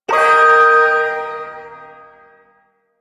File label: Ersatz Shawzin Pent Min Chord; Warframe; Duviri Ersatz Shawzin